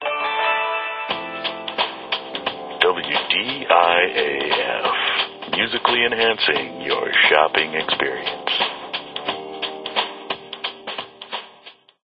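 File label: Music Ambient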